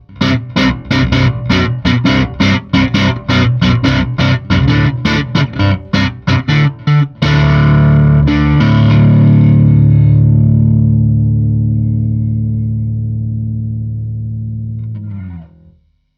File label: hiwatt, bass